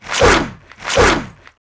Were Bear Atk

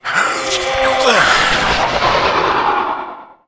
ghost knight die

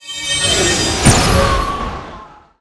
Blinding

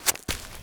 Cat Black Action

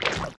Crab

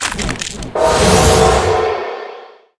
Spider Atk

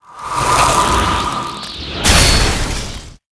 Spirit Ice Skill; Seer; GamigoNA

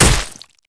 Dmg Mace Skin

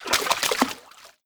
Turtle Sea Idle

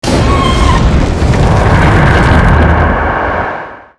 door open

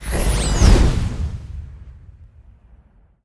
Atk Skill Sw

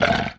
Robo Dmg

